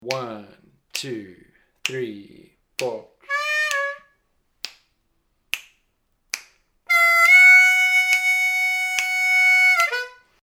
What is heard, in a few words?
Lick, harmonica